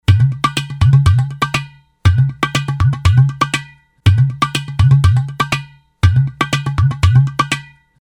Ceramic Drum; Apple GarageBand 2; Apple Loops

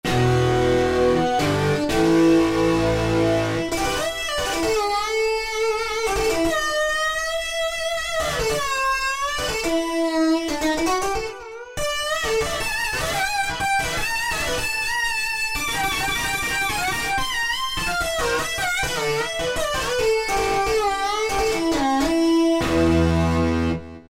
Dist Guitar, Korg M1; Factory preset examples

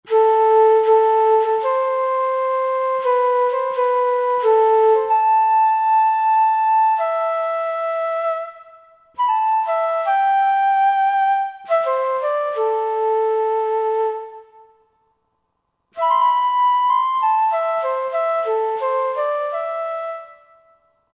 Celtic Flt, Roland SR-JV80-16 Orchestral II

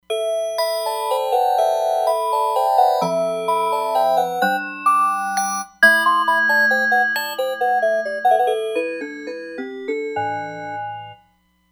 5th Key, Roland Fantom XR

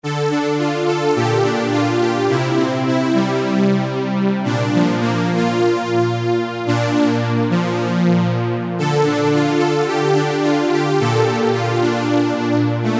PADDING; Akai SG01v

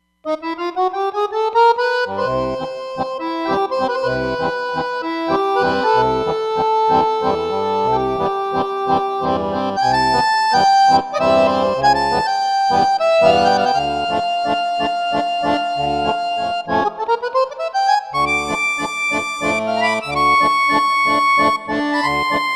Paris Romance, Roland Fantom XR patch

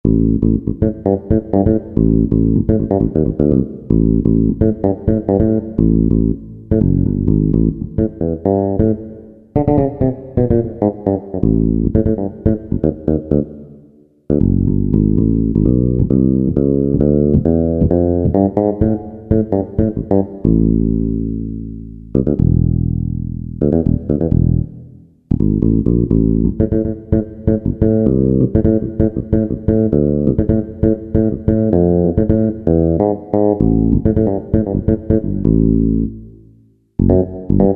Finger Bass, Korg MSC-6S Fretted Insts; Fretted Insts 1

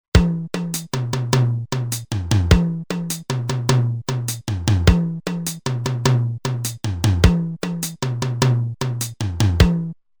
Fill In 4-4, Roland TR-505; Factory Rhythms

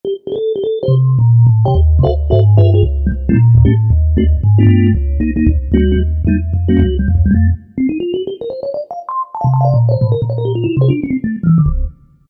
16+2 Org; Yamaha DJX; Voices

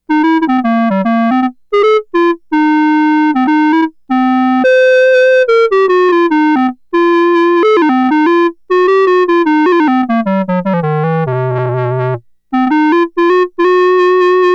Clarinet, Roland SH-2000

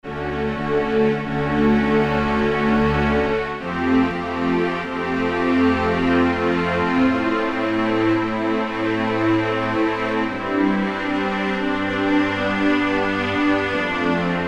Strings; Strings II

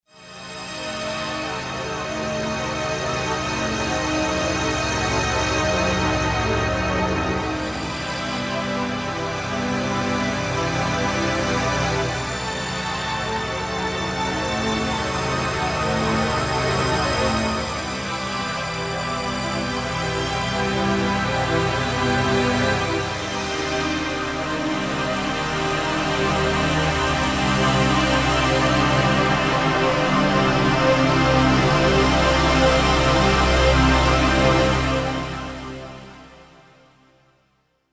MOD SWEEP, Roland SC-880; Factory Patches

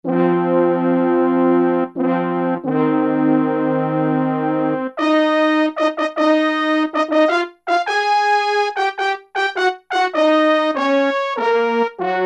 F. Horn Sect, E-mu Proteus-1; Presets

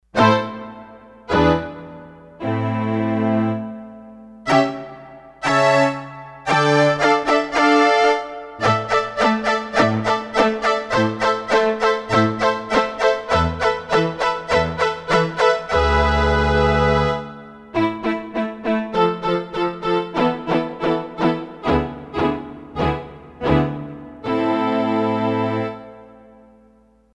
Bowed Strings, Roland D-10; Factory Patches

